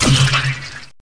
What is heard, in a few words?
die zombdroid Servant